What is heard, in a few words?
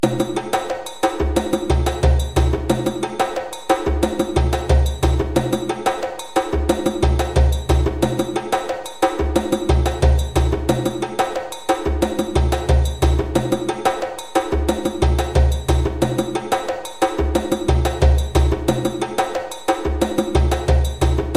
Bolon Groove 180 bpm, djembe